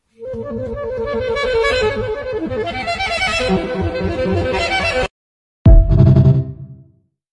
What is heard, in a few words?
bugle bugle ooow